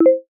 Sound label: wake up; Samsung Galaxy S10; Bixby